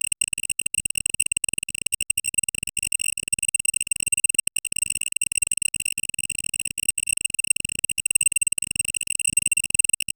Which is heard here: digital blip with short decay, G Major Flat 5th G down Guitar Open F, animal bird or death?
digital blip with short decay